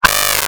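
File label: very very distorted meow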